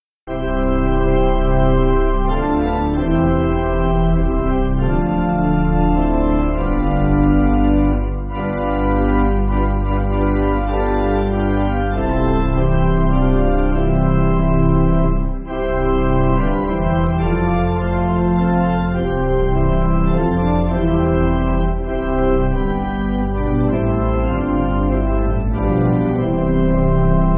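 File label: Pipe Organ, Free Music Backing Files for Churches; 在隱密處與神同關鎖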